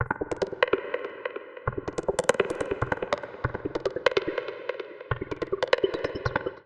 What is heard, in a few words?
Seq A Minor 144 bpm; MIDI Clips; Ableton; Skitter and Step; Power On